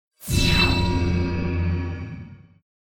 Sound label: charge; weapons